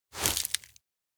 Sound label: dry flower step; anemoi